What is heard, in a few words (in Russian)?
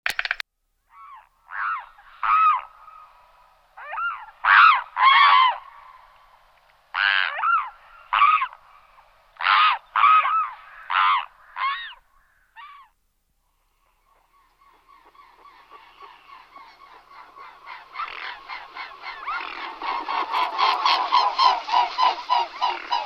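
lebed shipun golos, mute swan voice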